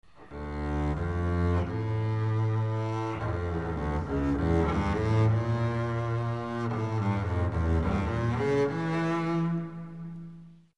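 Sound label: double bass; Контробас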